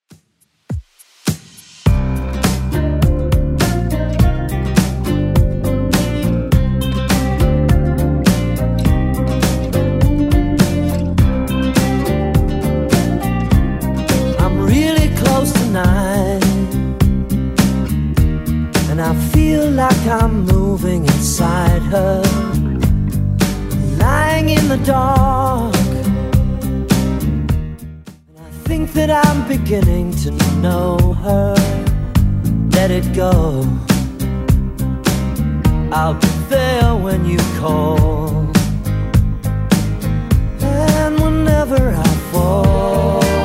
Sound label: 80s Redrum 103bpm